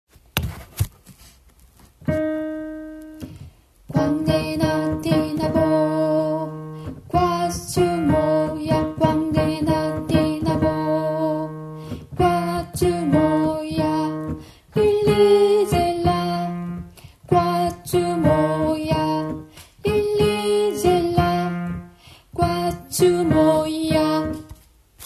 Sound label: kwangena thina bo alto